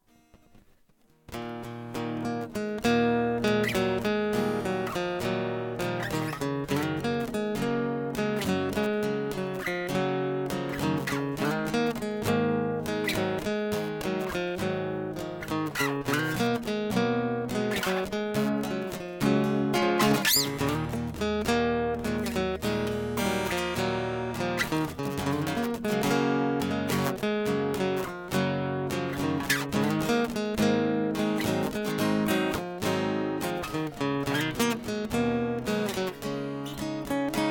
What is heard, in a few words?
awesome riff